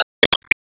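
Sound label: Sound Effect Wall Bound Special ball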